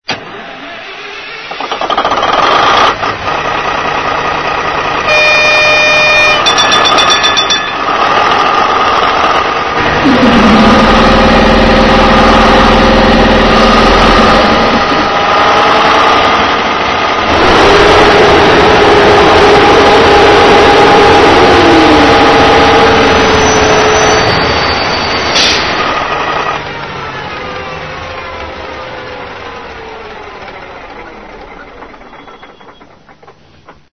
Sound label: Diesel Mehano MaK Vossloh G1206